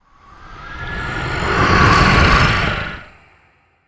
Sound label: Dark Wind Growls